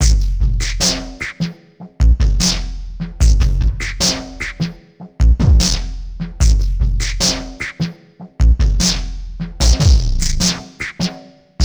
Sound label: TRIPHOP; INIS; Funky Xtreams